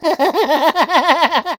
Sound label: cluwne laugh